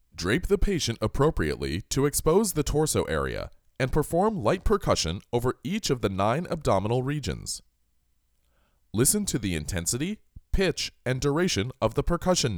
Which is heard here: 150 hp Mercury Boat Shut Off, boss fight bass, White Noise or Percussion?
Percussion